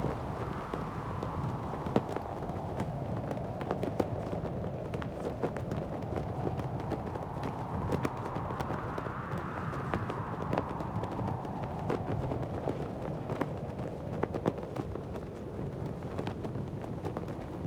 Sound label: Ambience Peak